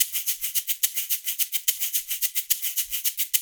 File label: loop, percussion; InVision Interactive; Percussion Slam; RULE WORLD